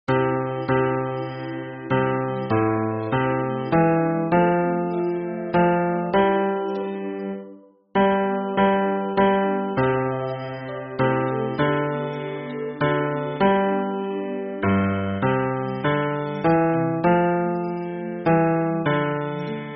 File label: hymn bass